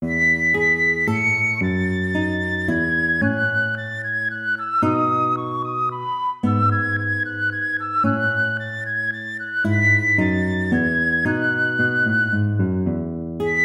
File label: minuet major